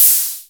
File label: Hats Electro Open